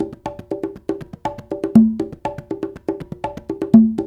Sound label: World Congas, 120